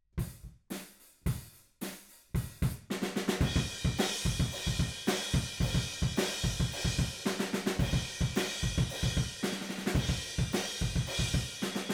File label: Room